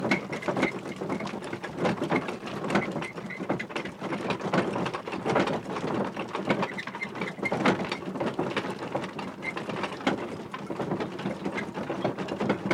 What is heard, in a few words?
horse pair vehicle drive; teardown; ps5 windows xbox series xs